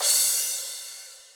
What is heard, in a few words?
soft hitfinish